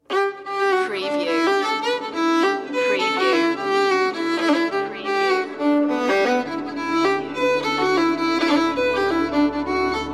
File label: celtic violin; celebratory